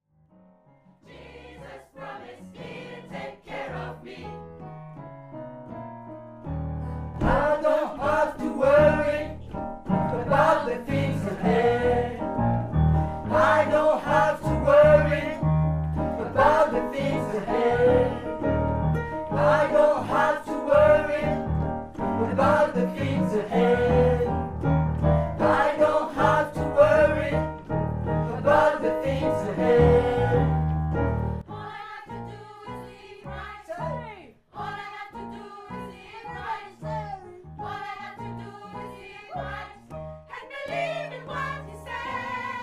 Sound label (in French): alto